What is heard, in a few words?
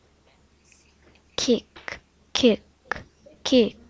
kick